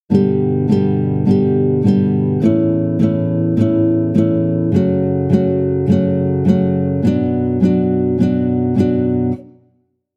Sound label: guitar